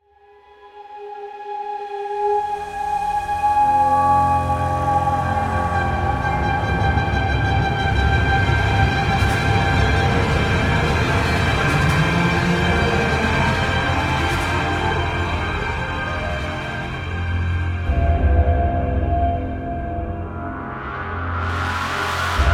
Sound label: String; Middle-earth Shadow of Mordor